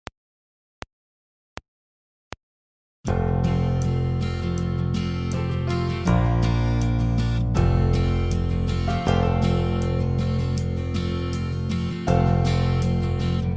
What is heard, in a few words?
Country Man, baixo; dan dean